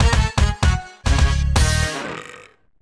Lose; leisure suit larry magna cum laude

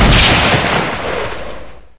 enemy shot; Wild West Shootout (Arcade) (gamerip) (2019)

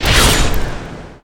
Shock Wave

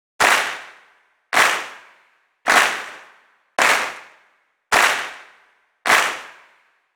Clap Many

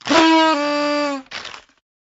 Stinger; TubeYou

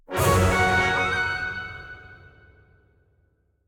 Jingle Start Game

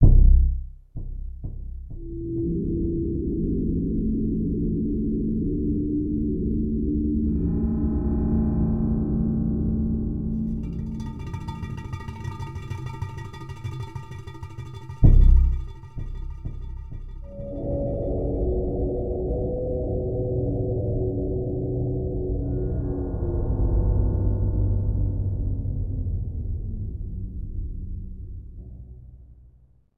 Amb Indoor

